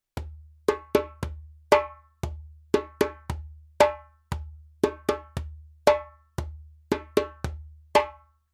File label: djembe; djembebog oevelse